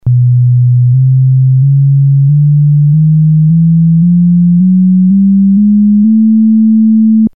carrier; nord3

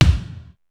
brt punch; sr16 bd

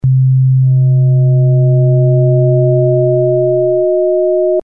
mod ring; nord3

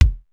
old wood, sr16 bd

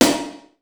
pop room; sr16 sd

